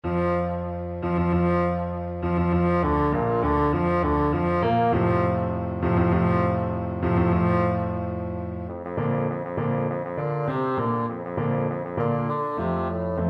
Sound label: rossini william tell, bassoon